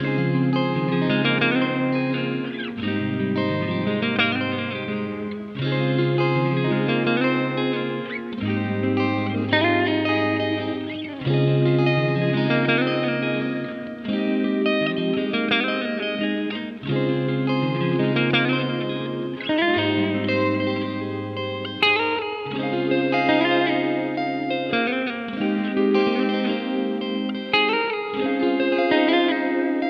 Journey Guitar Loops, 85 BPM G# Maj; Waterfalls; Cymatics

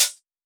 G House Open Hi-Hat; Drums; One Shots; Cymbals; Hihats - Open

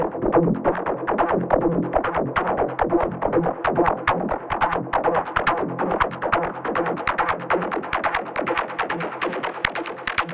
Lead Line F 140 BPM, Psytrance; Lead Lines; Snuffles

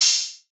Open Hihat; Cymatics; Eternity Sample Pack; Drum One Shots; Cymbals

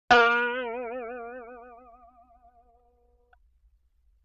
Boing Box High; Vintage Cartoon; Hits & Tones

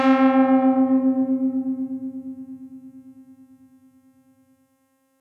ARP2600 KEYS Detuned Low C; Synth; One Shots; Cymatics; Fantasy Synth Sample Pack

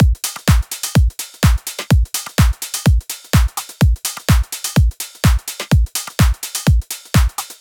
Tech House Kit 126bpm Full; EDM Drums; Drum Kits; Stems; Ghosthack; Ultimate EDM Drums; Tech House Kit 17

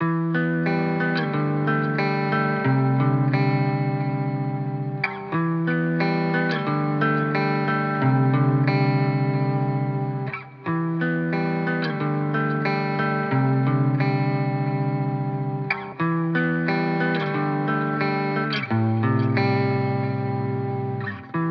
90 BPM E Min Main Guitar; Loop Stems; Cymatics; Miami Guitar Pack - Pt 1; Broken

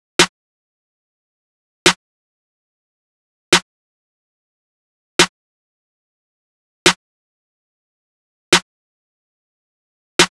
Drum Loop 144 BPM Snare, Trap; Cymatics; ANALOG EVOLUTION Beta

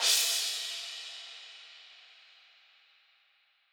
Crash Hybrid; percussion; Cymatics - Infinity Beta Pack 2.0; Zeus Percussion Collection

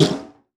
FX Snare

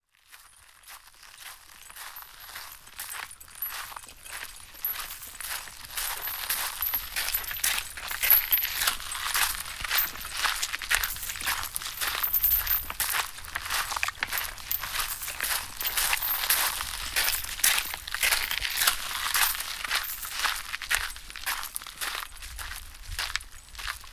Footsteps L R - Am 60Bpm; Construction Kits; STEMS; Ghosthack; SkyRealm; Kit 28 - Long Walk Home

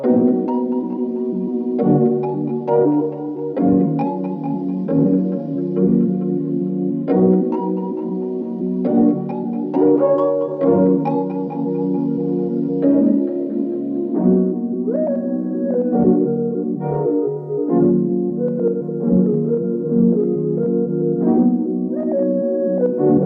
68 BPM C# Min, Vintage & RnB Melodies; Serenity; Cymatics

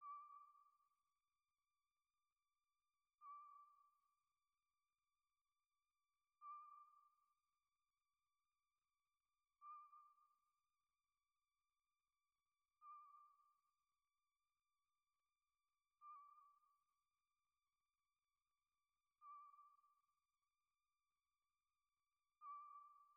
150 BPM C Min Accent, melody loop stem; Cymatics - 2022 Melody Collection - Wavy; Ganja

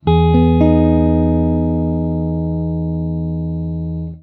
Guitar One Shots Emaj79; Guitars; Ghosthack; Advent Calendar 2019; Xmass

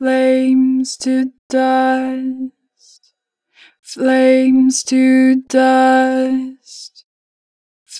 Flames, Harmor Vocals; 4 bar flat; Pitch Flat

